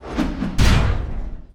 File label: Coin Land In Jackpot With Swish; godsoffortune